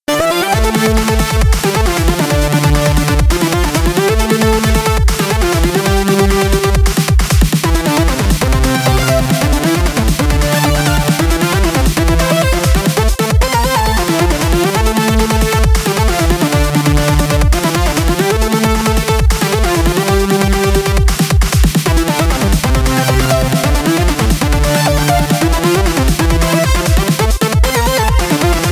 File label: 8-bit